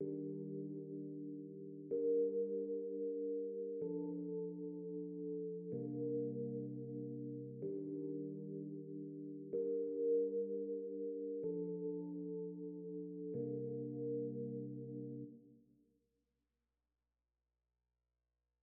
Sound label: 126 BPM G Min Keys; Melody Loops; Loop Stems; Hip Hop; Cymatics; Cielo